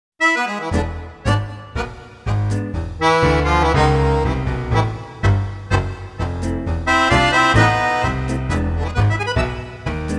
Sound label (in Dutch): Tango met Piano